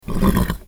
horse

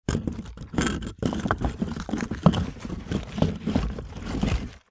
coal

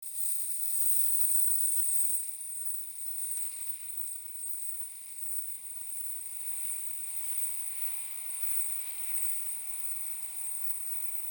chains

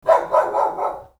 doggy

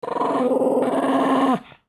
mad dog

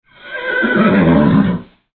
horse

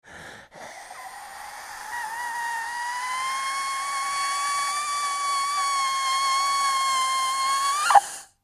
asthma lungs

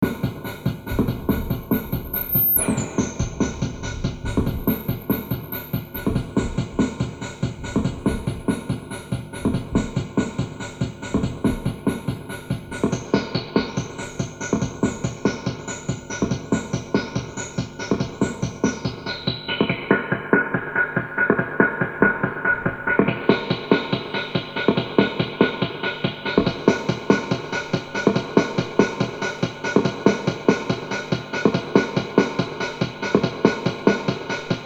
bird perc

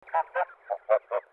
goose

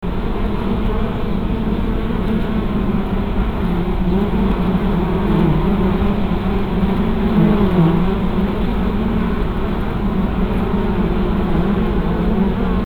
bees

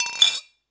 glas

